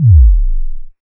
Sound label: Sub Drop C2; Zero-G Club Techno